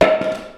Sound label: Smash Wood